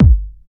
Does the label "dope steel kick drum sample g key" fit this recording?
Yes